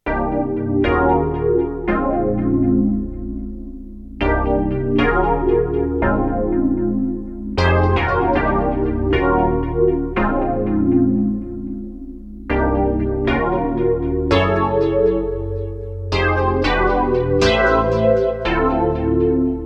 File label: House Chords; dinopark